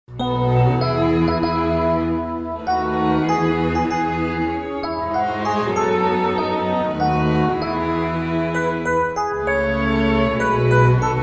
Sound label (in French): Mon beau sapin instrumental